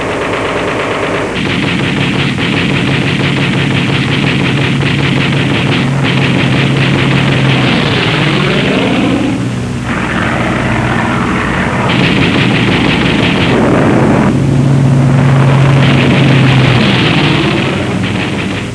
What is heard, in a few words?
antiair; warbirds